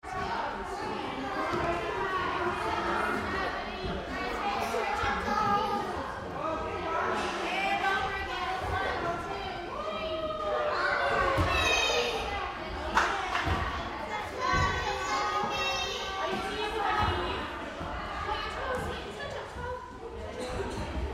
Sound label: Crowd Kids Gymnastics Busy; Miscellaneous Sport Sound Effects; BigRoomSound